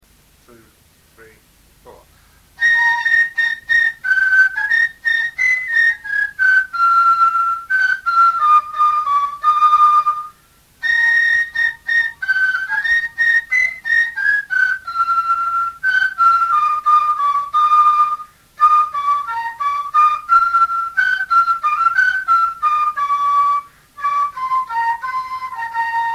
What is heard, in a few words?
fifer